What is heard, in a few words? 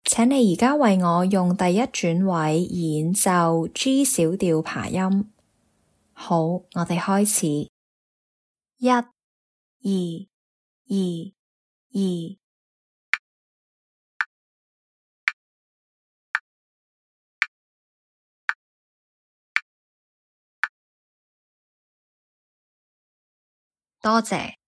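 G minor arpeggio first inversion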